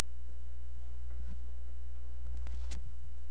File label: fry